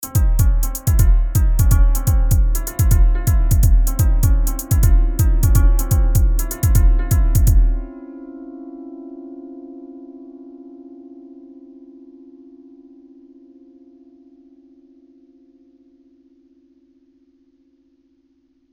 first beat; FL Studio; 1st beat

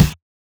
snare; goodtakimu drumkit; fl studio; drumkits

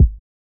kick; maily plugnb; fl studio; drumkits

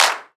Clap; fl studio; drumkits; bvker drumkit

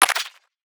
clap; goodtakimu drumkit; fl studio; drumkits

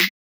snare; goodtakimu drumkit; fl studio; drumkits

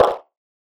snare; goodtakimu drumkit; fl studio; drumkits

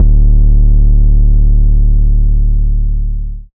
808 (D); drumkits; fl studio; bvker drumkit; Born 808

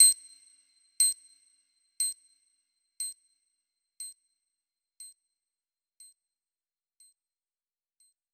perc (beep w delay); drumkits; percs; fl studio; xander brown drumkit

